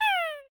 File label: Voices, Reaction, Male, Relaxed, Content, Mmm, Aaah; Viewfinder